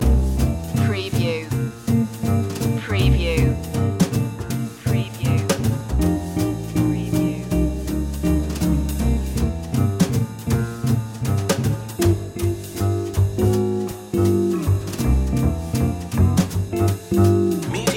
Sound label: kids blues long loop